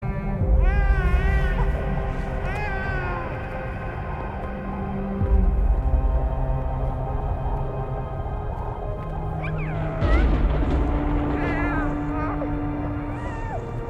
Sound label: child crying; KGF Chapter2 intro